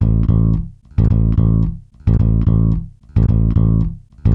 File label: WORKING MAN'S BASS